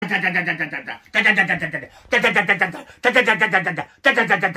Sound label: tatatatata